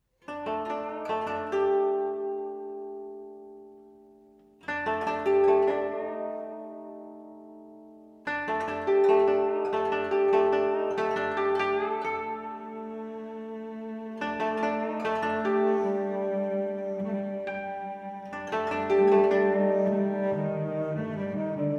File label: cello version, cello and koto